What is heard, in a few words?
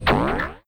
bubble; coffee crisis